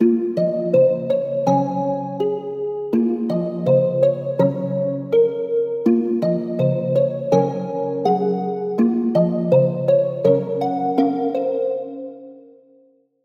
Key; Routed Sound; Serum 2 Expansion - Street Vanquisher Vol.1

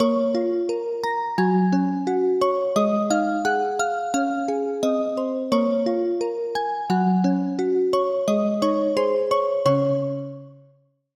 Bell, Wind Chimes; Routed Sound; Serum 2 Expansion; Story Walker Vol.1

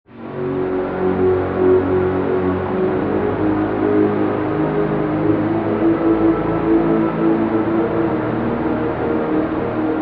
Pad; Roundabout Patch; Routed Sound; Vital Expansion - Reality Maker Vol.1

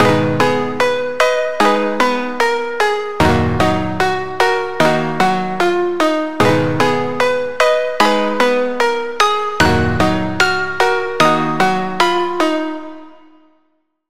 key, Sylenth1

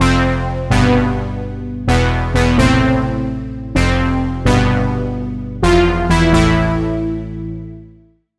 Lead, Serum 2; Lead - Elite Ceremony; Routed Sound; Serum 2 Expansion - Reality Maker Vol.1

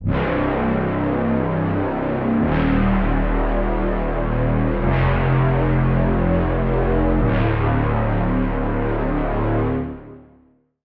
Lead - Rebel Rumbler; Routed Sound; Vital Expansion - Street Vanquisher Vol.1

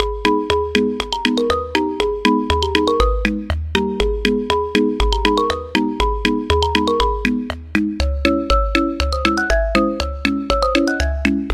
Key; Routed Sound; Sylenth1 Expansion - Story Walker Vol.1

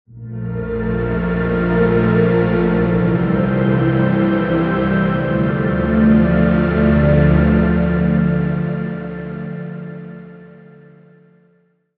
Pad, Atom Fidelity; Routed Sound; Vital Expansion - Reality Maker Vol.1

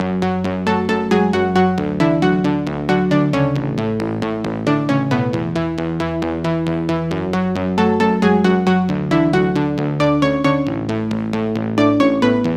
Pluck; Routed Sound; Serum 2 Expansion - Reality Maker Vol.1

